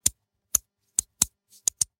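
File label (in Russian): bulavka zastegivaetsya zvuk igly; ukrasheniya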